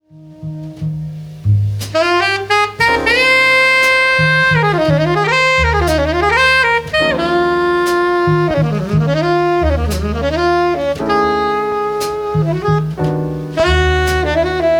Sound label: jazz sax